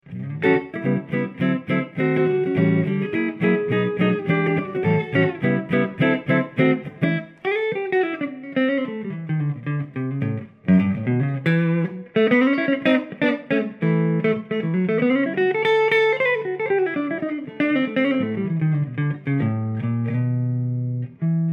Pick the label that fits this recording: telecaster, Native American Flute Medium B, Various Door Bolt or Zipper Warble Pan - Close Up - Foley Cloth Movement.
telecaster